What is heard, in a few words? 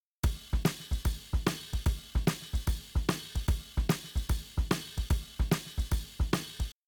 Drums, shuffle drums; WDR2